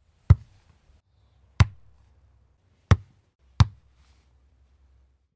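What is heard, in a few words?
series of hits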